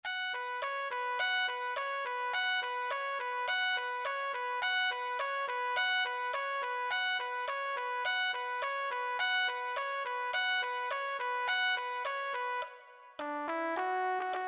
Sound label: trumpet